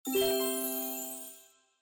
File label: ui unlock new map